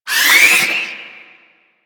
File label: Hand Bomber Proj Warning; Warframe; Man In The Wall